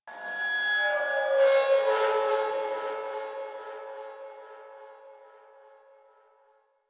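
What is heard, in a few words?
ship metal scrap texture medium; Ambience; Warframe; Corpus Ship Remaster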